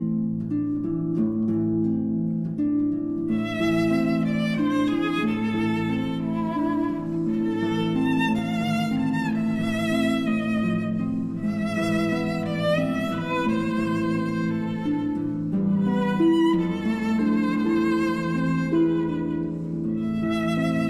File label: Berceuse, for violin and harp